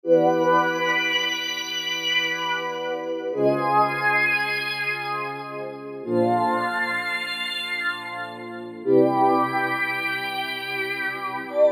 Silk Pad, Korg MS2000; Factory Patches

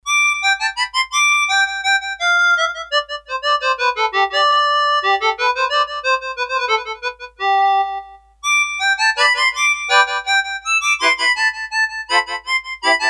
Reed, Korg DS-8; Factory Patches

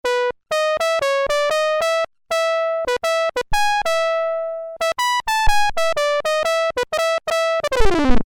Piccolo Trumpet, Roland Juno-106; Factory Preset

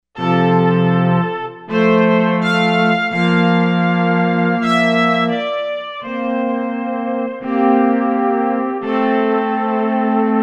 Chamber

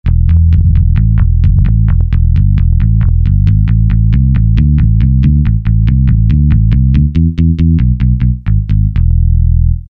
Alesis QS8, Bass; Factory Preset examples

